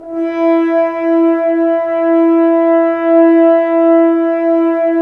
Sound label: Brass F.Hrns E; Miroslav Vitous - Woodwind Brass Ensemble